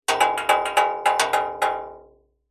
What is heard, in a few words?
foley metal thin object short rattle clatter larger metal frame